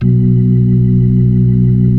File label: B-3, Keyboards of The 60's and 70's; Club Xfade